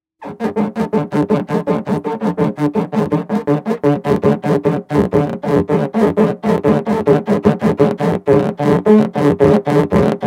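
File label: balloon sound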